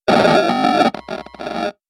game glitch

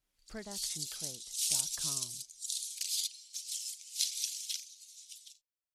Leaves and Twigs Rustle; SoundsCrate

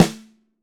snare; soundscrate

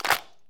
soda can crush; soundscrate

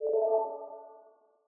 graphics application notification; soundscrate

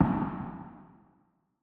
gun shot with reverb; soundscrate

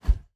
Fighting Woosh; SoundsCrate

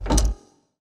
metal switch; soundscrate

